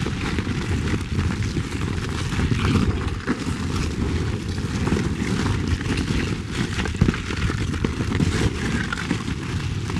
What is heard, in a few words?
scatter Wheel Spin; asgardianstones